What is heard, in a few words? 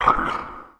Pulp; toy zombies pulp